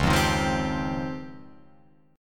Db Major Sharp 11th, Guitar Open F; strummed

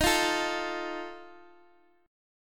D sharp Suspended 2nd Flat 5th D sharp; strummed; chord; x,x,x,8,6,5; JGuitar

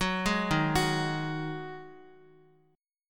Eb Minor Double Flat 5th, Guitar chord; JGuitar

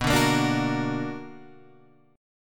B Minor Major 7th, Guitar, Open G

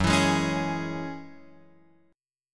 Gb 7th Suspended 2nd Gb down Guitar Open F; strummed

